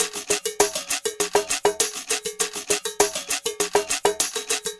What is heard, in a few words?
Ensemble, Latin Percussion